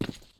default, step

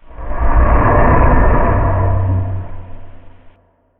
move, ambient

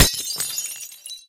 glass, bullet collide

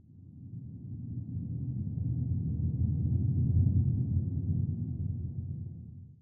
ambient

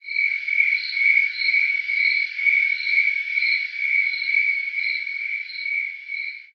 crickets, ambient outdoor

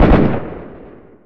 shooting; ambient; outdoor

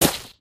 gravel, human step

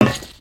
metal plate, human step

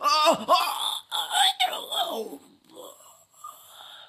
killed

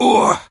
wound, stalker; monsters

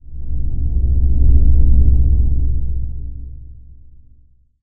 breath, underground

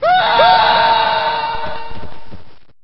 fall scream; ambient

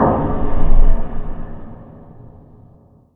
hit, underground; ambient

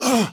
pain

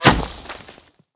sand, bullet collide

